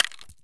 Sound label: saiga round out; Escape from Tarkov